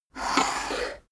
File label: poker chip slide table